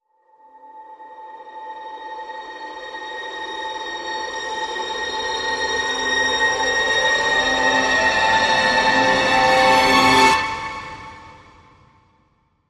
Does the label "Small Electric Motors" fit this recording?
No